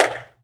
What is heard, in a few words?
tap Monkey, tap trial; HeavenStudio